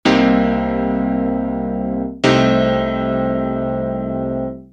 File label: Acoustic Piano